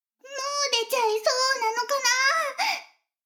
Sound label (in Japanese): シコシコ音声; 逆時間停止されて